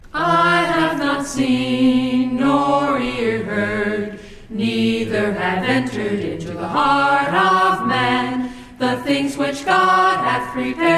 a capella scripture song; 1st corinthians 2 9

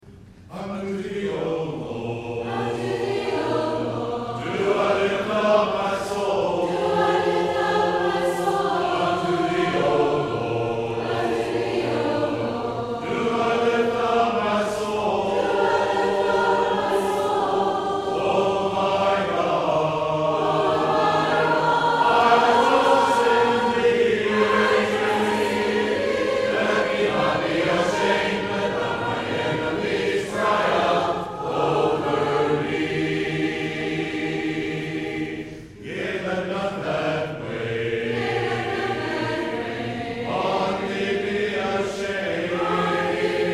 a capella hymn

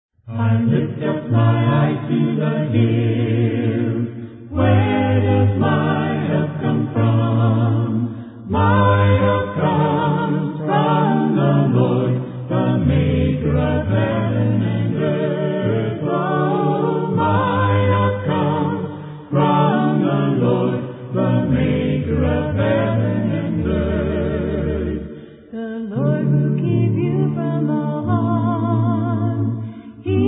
a capella hymn; lift up my eyes

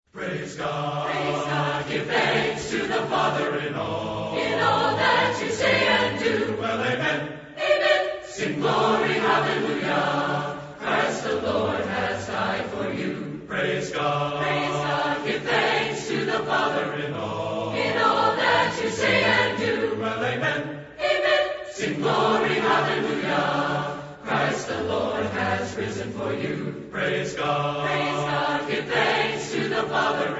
a capella hymn; praise god

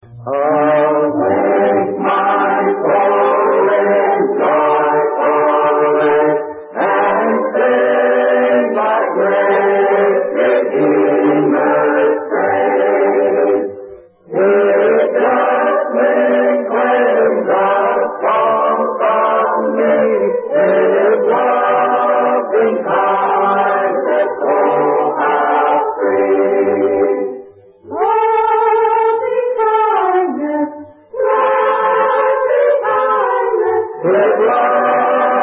a capella hymn; loving kindness

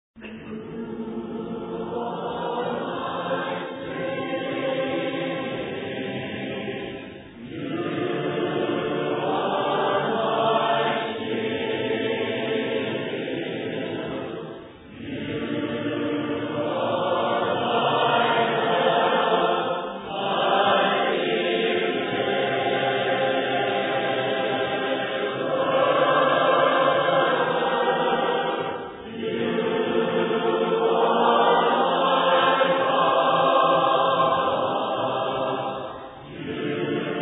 a capella hymn, you are my strength

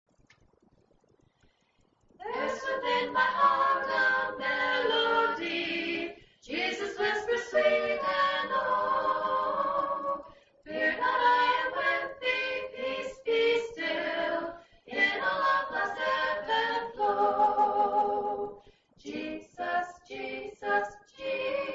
a capella hymn; he keeps me singing

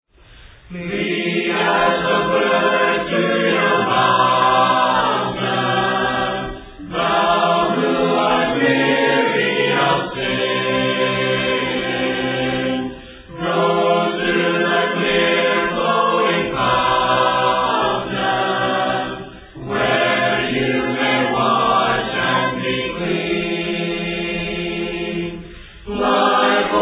a capella hymn; flee as a bird

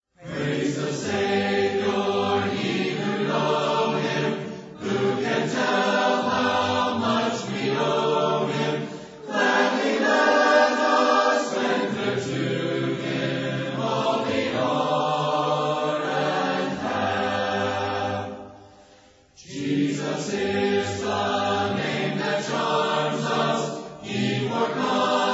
a capella hymn; praise ye the savior ye who know him

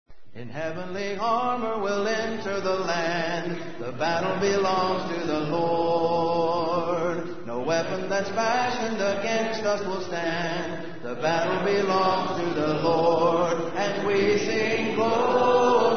a capella hymn

